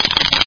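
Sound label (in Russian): igralnye kosti peremeshivayut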